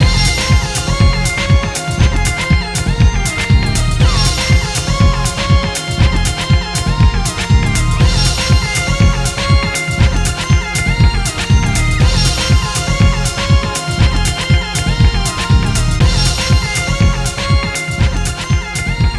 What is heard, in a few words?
Player Down; Disco Kid